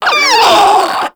Infested Crawler Hear Sound; Warframe

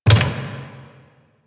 Turret Dry Fire; Lasrian APC

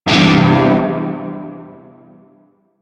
Shawzin Pent Maj Chord F; Music; Warframe; Narmer Shawzin

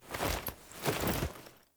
Foley Action Wolf Mount; Characters; Player

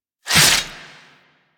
Pilot Finisher Stab In 3D; Warframe; Rail Jack; Grineer Crew Ship

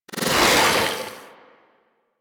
Teleport Out; Warframe; Teralyst; Ropalolyst; Abilities

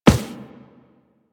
Walk Metal, Footsteps; Warframe; Operator

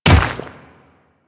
Royal Guard Dirt Step, Footsteps; Warframe; Grineer Royal Guard

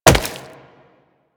Wall Jump Rock

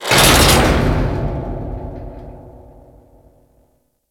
Ocean Metal Tube Hatch Open; Ambience; Warframe; Grineer Ocean

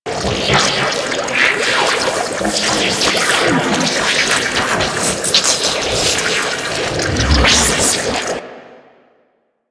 Plunge Ability Jealous Erupt Loop; Enemies; Abilities; Warframe; Duviri; Duviri Dax; Dax Knight

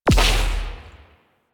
Edge Kick; Warframe; Warframes; Pacifist

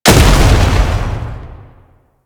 Tank Cannon Projectile Explosion; Enemies; Weapons; Warframe; Lasrian

